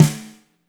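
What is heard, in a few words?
fat snare left; Spectrasonics; Burning Grooves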